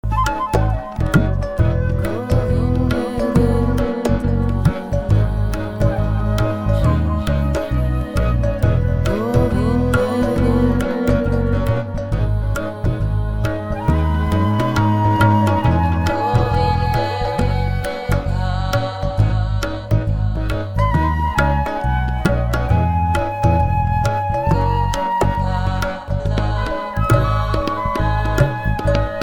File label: gopala hare dub; Cd Krishna